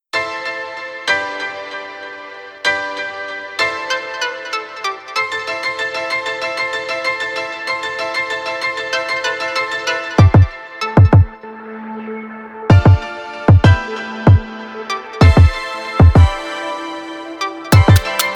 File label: Instrumental